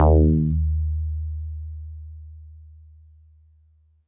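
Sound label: Mono Bass DX100 D#6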